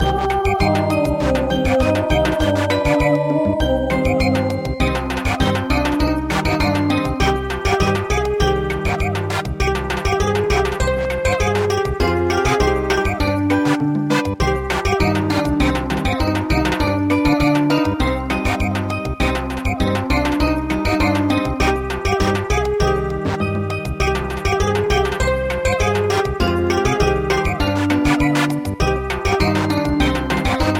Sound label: Samba; Rain